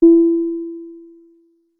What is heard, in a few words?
ding